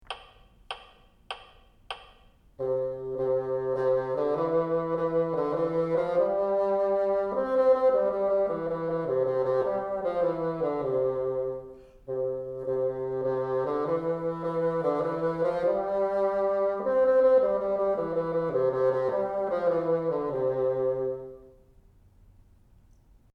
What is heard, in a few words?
teacher, bassoon